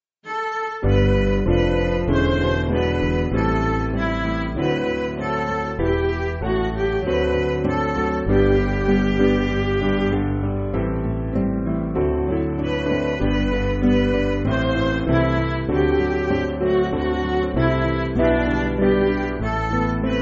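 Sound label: Midi, Music Backing Files for Churches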